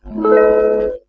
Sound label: dialog error